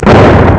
Donner; ST-XX Amiga Soundtracker Sample Packs

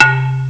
Geschirr; ST-XX Amiga Soundtracker Sample Packs

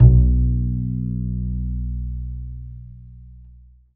KONTRABASS H; Alpine Volksmusik

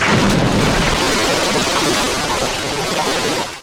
WASSER; Best Service; Advanced Mega Effects

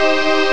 string moll; ST-XX Amiga Soundtracker Sample Packs

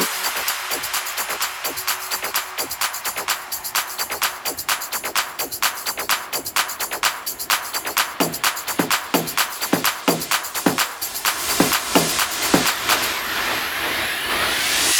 Drum Loop 128BPM FULL ohne BD

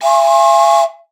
ZUG PFEI; Best Service ProSamples vol.42

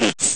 schnarchen; Amiga Soundtracker Sample Packs

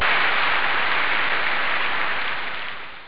klatschen; Amiga Soundtracker sample

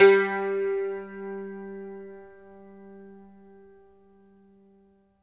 HACKBRETT G 1; Best Service; World Colours

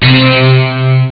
twaeng; Amiga Soundtracker Sample Packs

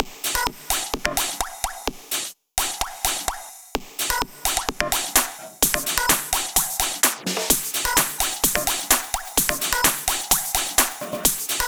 Drum Loop 128BPM FULL ohne BD

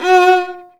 GEIGE; Best Service; Alpine Volksmusik

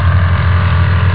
rotor saege; ST-XX Amiga Soundtracker Sample Packs

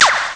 Zisch; ST-XX Amiga Soundtracker Sample Packs

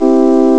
dur loop; ST-XX Amiga Soundtracker Sample Packs

